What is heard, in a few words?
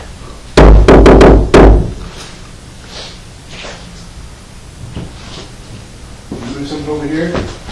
knock or footsteps; Athens IL Bitterroot